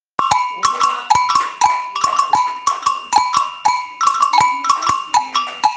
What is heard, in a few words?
Agogos ternaire